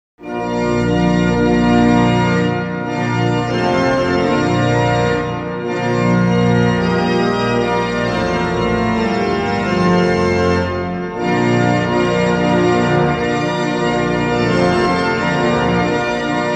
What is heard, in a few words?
ORGAN; WAGNER BRIDE; svatby